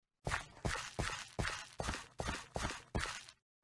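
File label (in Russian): shagi po griazi, footsteps on mud; minecraft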